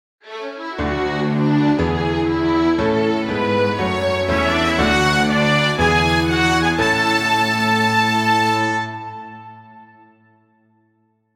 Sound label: fanfare